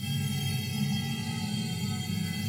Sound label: debuff; smite; khepri